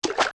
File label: Water Land; Zelda; LTTP